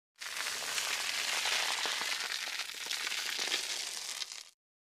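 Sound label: Sandbag Dragged Across, sand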